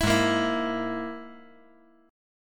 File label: Ab Suspended 2nd Sharp 5th Ab, chord; strummed; JGuitar